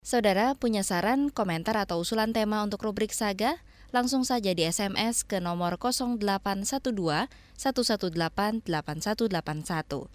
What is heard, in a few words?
Closing; SAGA